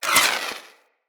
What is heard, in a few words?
Wall Hand Slide; Footsteps; Wall Run; Characters; Tenno